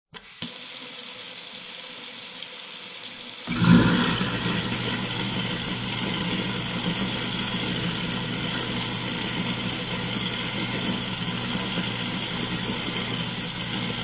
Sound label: Garbage Disposal On Run Turn Off, Household, Garbage Disposal, Turn On, Run, Turn Off; Kitchen